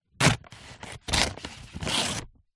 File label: paper sheet thick slice cut knife